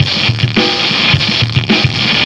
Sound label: System Overload Beat, Processed Drumkits; Sonic Foundry (Sony Creative Software); Processed Drumkits Zero Gravity Beats